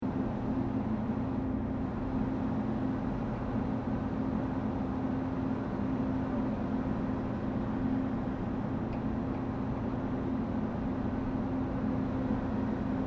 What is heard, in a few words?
Boat Tugboat Deck Structure Ambience, Japanese Tugboat; Loop; Boats; Vehicles